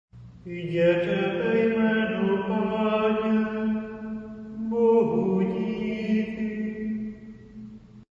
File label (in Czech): propusteni choral